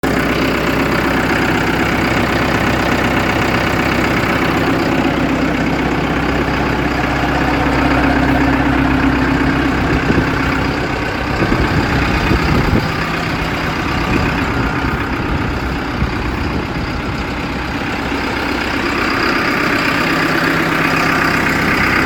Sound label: tractor; oakdown holiday park